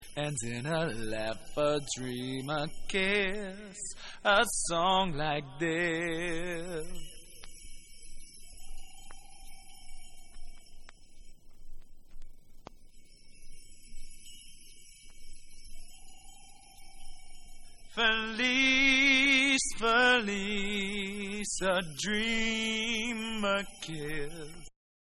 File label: UK Remix Acapella